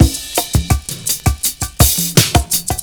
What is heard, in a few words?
PERCS, 70 s Breakbeats